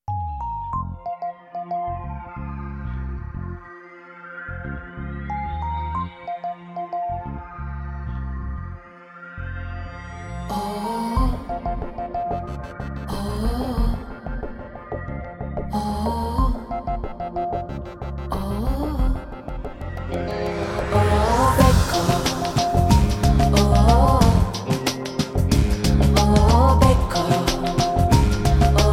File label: Jingle; Beko